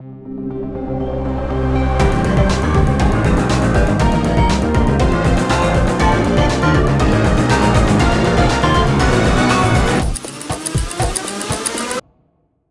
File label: Music Quest Final 10 Seconds